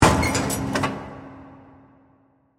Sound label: Mechanical Assembly, Scifi, Mechanical, Assembly, Joint, Connect, Metallic, Science, Fiction; Spacecraft